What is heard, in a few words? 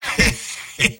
Engineer laugh short; Engineer Robot audio responses; Robot-filtered lines from MvM